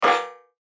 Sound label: Chime High Tech; Chimes; Multimedia; Blastwave